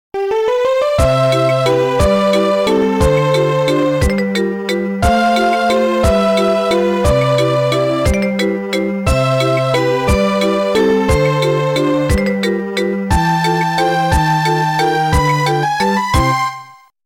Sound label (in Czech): melodie